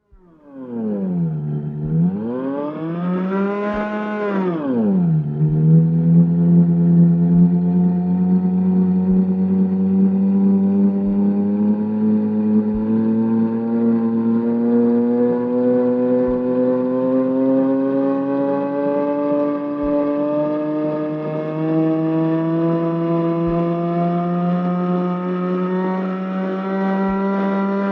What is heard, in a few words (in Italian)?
ululatore; russolo luigi